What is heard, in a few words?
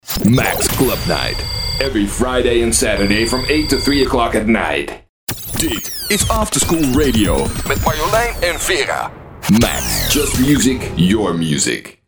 Jingles; SilverLineVoice